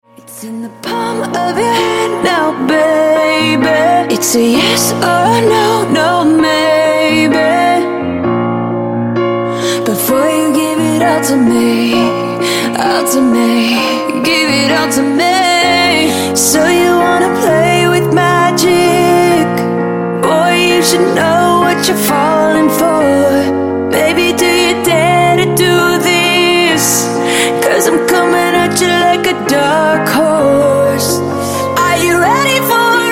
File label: acoustic version